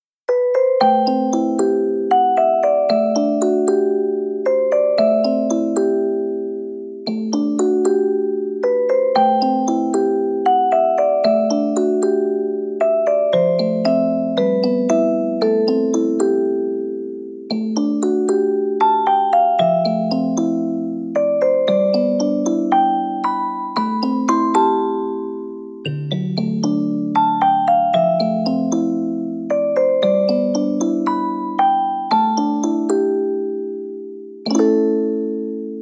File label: Music Box